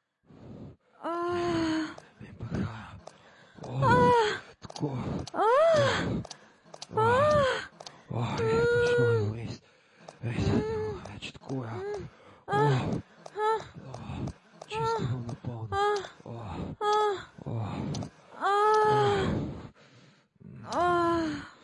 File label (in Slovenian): sex na vlaku orgazem; avdio eroticne zgodbe